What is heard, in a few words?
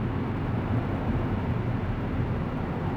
city, chicago